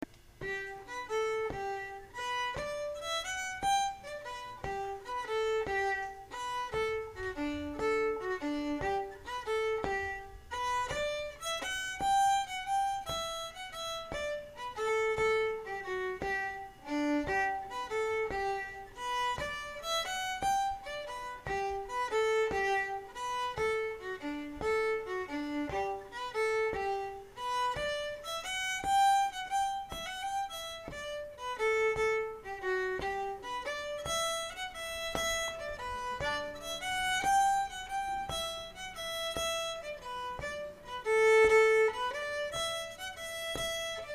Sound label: slow